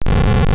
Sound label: Rumble; Zelda; MC